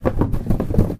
cardboard box shake; physics; Source Engine; cstrike

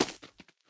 heavy step; taunt; player; yeti standee; source engine; tf

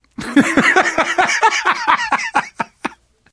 laughter, survivor voice

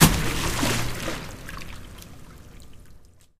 panel splash water; Source Engine; Portal 2

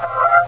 tentacle search; Source Engine; bshift

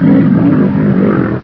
ag alert; agrunt; video games; source engine; bshift

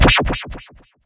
hitsound vortex

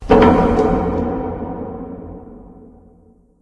mall random; ambient; Left 4 Dead 2; Source Engine; random amb sfx

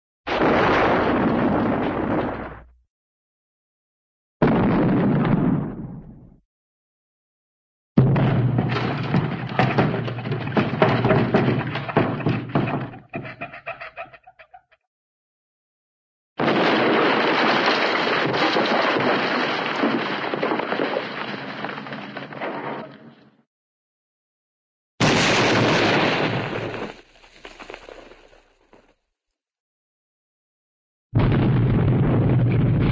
explosion small explosion with debris; cartoon

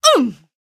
pain, female; video games; npc; source engine; episodic; episode 1

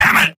soldier negative vocalization; tf; mvm

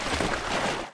slosh, footsteps

